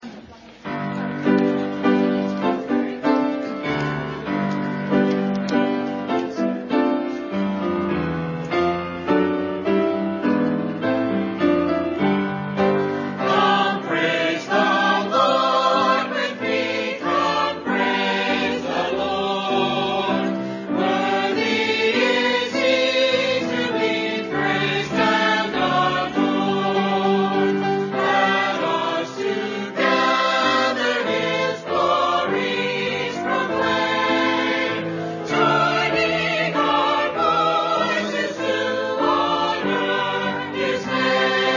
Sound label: choir